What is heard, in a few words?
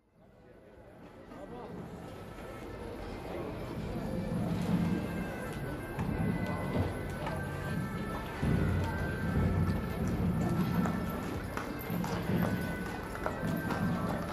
binaural; Bartlomiej Bielinski; Z civilizace do prirody